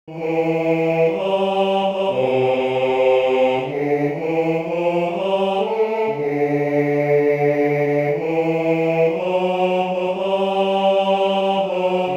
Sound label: Canada Bass